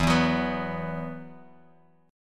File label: E Suspended 2nd E, down, Bass Standard